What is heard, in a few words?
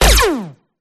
GUN LASER GUN; PMSFX